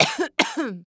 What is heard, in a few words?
Human Female Cough Short Cough